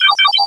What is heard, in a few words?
secret door; tfc